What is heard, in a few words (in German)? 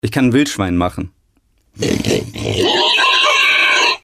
Wildschwein